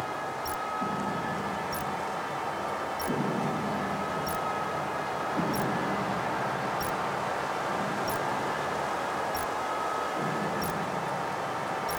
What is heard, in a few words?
Building Blocks hitek Pagoda Roof; Buildings; Megacity